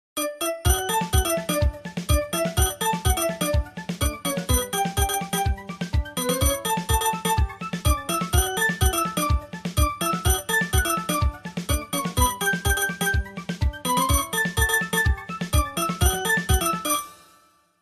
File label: frolic nokia 3120 ringtone